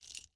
Inspect Shell Mvt J, weapons; odin

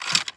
Inspect Belt in Mag, weapons; ares

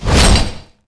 zombie mid slash, claw; tayo escape

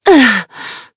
pain; reyna

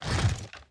Inspect Mvt A, weapons; odin

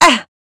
pain; astra

